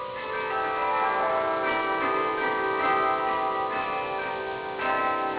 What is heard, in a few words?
plumb, carillons